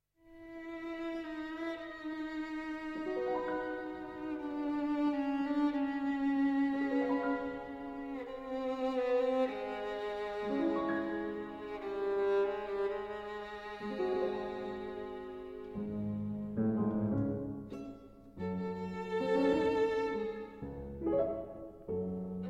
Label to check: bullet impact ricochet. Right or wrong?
Wrong